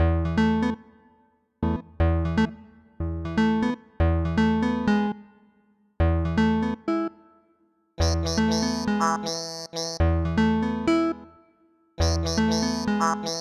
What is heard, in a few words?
Synth (Live); animal crossing new leaf welcome aamiibo soundtrack